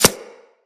Arrow Impact; Weapons; Bullet Impacts

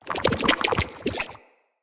Corrupted Slug Foley Move; Creatures; Movement

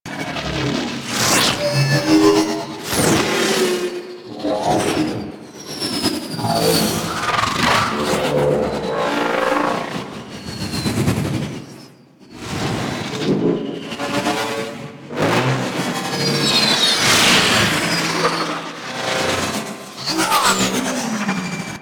Ship Pass By Loop Fast, Engines; Warframe; Vehicles; RailJack Enemy Ships; Corpus; Engines Generic

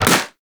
Primary Shot, Weapons; Warframe; Sapient

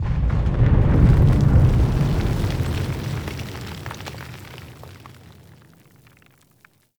Battle Ambient Tunnel Rumble; Warframe; Tau

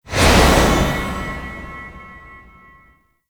Stadium Stage Group Transition; Gameplay; Warframe; Nineteen Ninety Nine

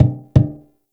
Finger Thump; Railjack Hunt

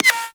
Bullet Impact Ricochet; Warframe

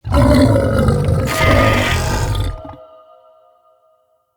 Corrupted Melee Attack Heavy Growl; Vocals; Bromius; Animals

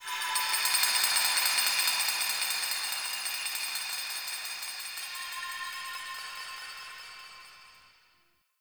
Glassy Texture; Ambience; Warframe; Shadowgrapher

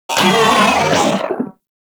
Moa Vocal Death; Warframe

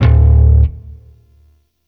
Shawzin; Warframe; Sentient Shawzin

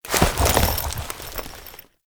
Horse Gallop Start Whip; Vehicles; Foley Actions; Warframe; Duviri

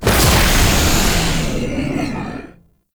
Power Grid Button; Warframe; Duviri Murmur

